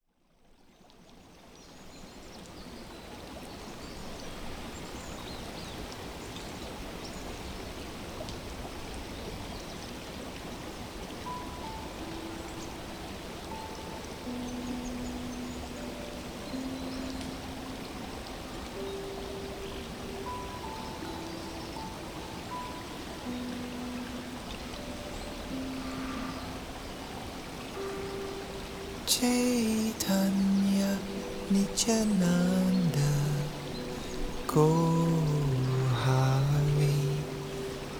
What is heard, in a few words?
Forest Relax